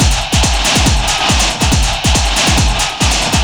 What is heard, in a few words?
Noisetech, Drums Beats